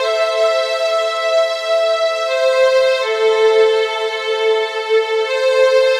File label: Sweet Strings